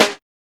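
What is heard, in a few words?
snare drum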